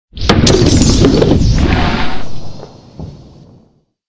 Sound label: twisted spell explosion; sfx; overte; partyBall